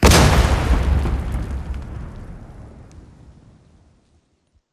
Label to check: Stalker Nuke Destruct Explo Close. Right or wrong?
Right